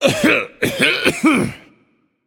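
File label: non verbal clear throat, voice; cernunnos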